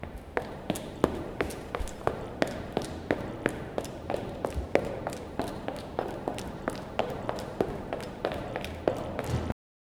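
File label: steps the supermodel, fashion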